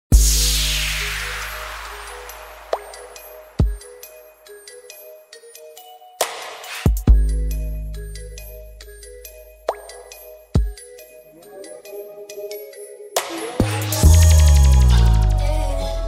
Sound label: apple ringtone